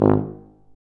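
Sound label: brass C tuba, staccato; Solo Brass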